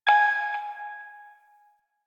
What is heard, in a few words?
Hack Minigame Touch Node